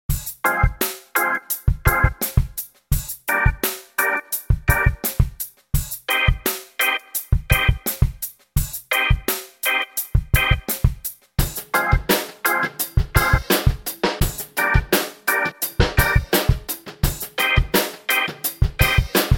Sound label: Reggae Remix